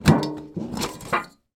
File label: tin chocolate sweets lid open; foley; sound effects; sound effects one